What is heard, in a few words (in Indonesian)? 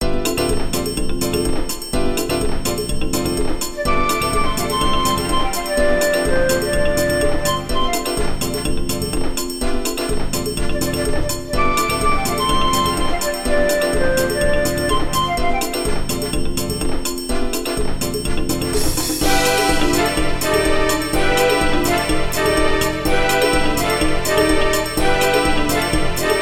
Nada Dering Nokia Jadul Funk